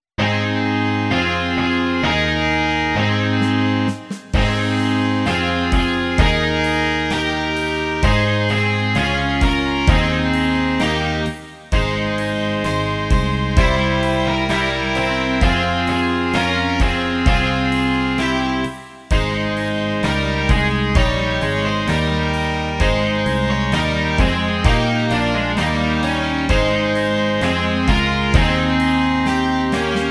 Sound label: rock